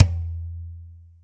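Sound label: TOM loud; Zero-G; Chemical Beats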